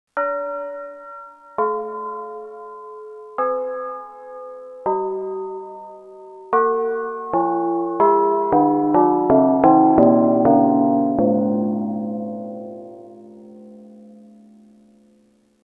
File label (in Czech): zvony